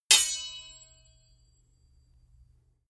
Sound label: sword hit sweetener